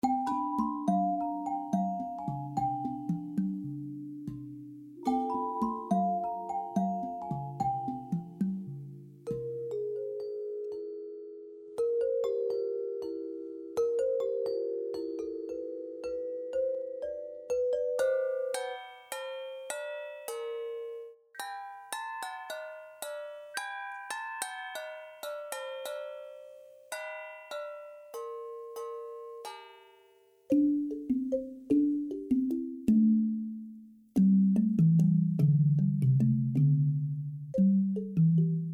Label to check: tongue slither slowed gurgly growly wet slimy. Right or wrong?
Wrong